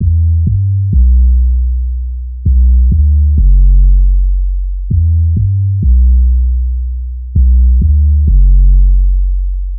bass 808 98bpm; narcolepsy

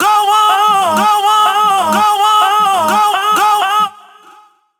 vocal loop g- wet 125bpm

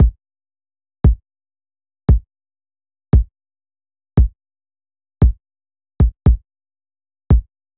115 A Kick

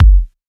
Single Kick

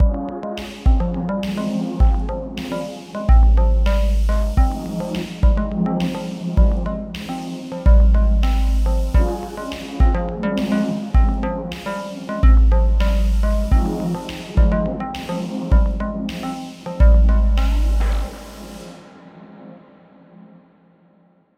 105 Fm texture full

